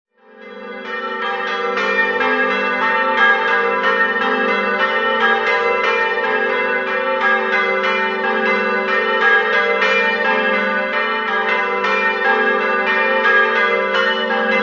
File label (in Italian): carnia, campane